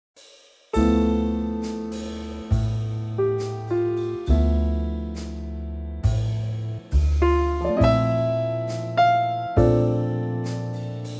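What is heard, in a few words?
D minor trio; key - Dm - vocal range - F to Bb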